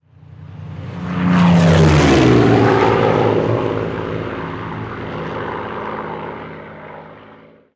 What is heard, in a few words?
plane; Ambient